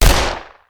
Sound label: Gun, Machine, M3, Single Shot; gun and weapon sounds; captain audio; fully loaded